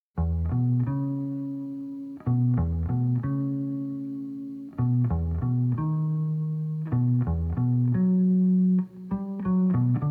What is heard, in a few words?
Trio Lullaby